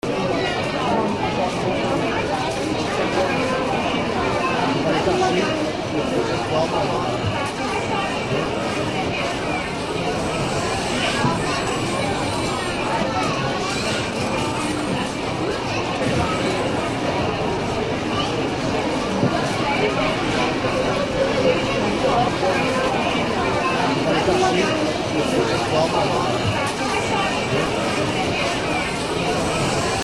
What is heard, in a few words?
holidays toy store chaos